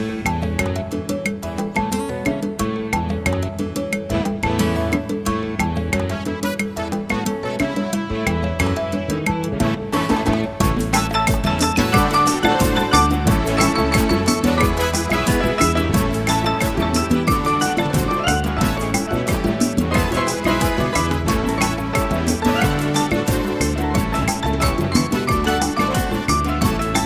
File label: Salsa Plus